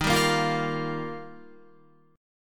Eb Major Add 9th, Guitar, Ebadd9 chord; Ebadd9 strummed; 10,10,10,10,10,13; 4F,4A,5C,5G,5C,6E; JGuitar

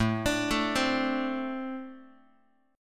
A Minor Double Flat 5th, down, Guitar; arpeggiated; JGuitar

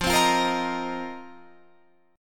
F 7th Suspended 4th F down Guitar Open F; strummed

